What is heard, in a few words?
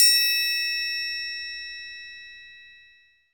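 small triangle; Northstar - Drumscapes Roland; Bonus Perc; Triangles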